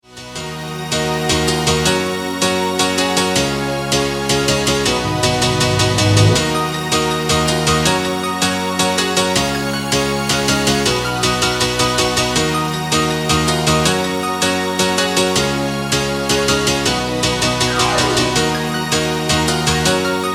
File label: Piano Progression